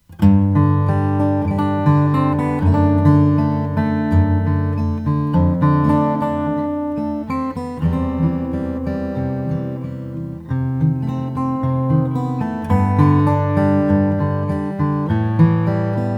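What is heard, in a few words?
kostal mod d madagascar rosewood, guitars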